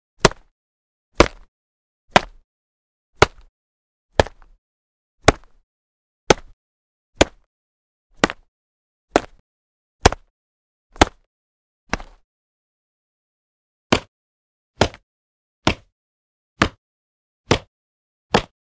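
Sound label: bejsbol popadanie myacha v perchatku, baseball hitting a glove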